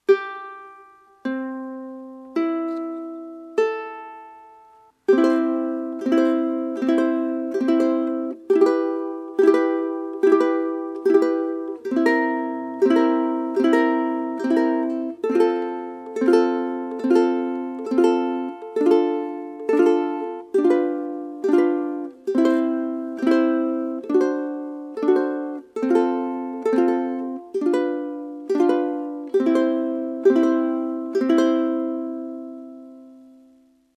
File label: GString after, ukulele